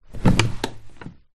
office chair executive person sit down recorded underneath; zapsplat